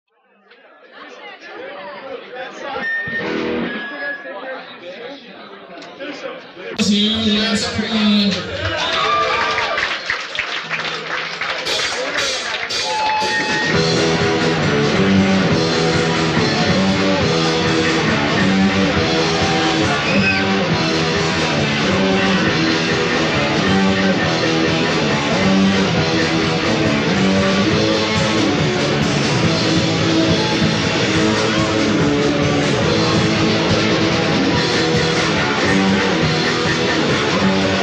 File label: Instrumental; West Catty Playground Catasaque PA 4-12-86